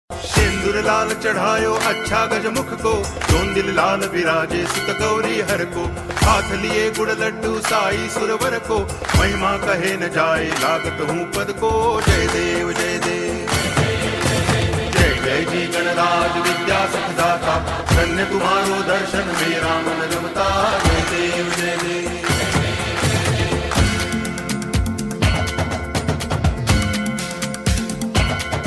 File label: Ganesh aarti